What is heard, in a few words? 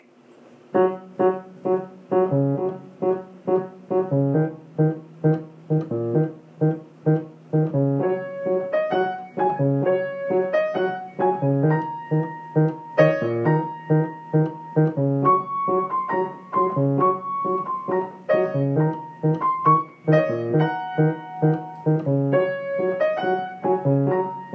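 Nona Desert tango, Piano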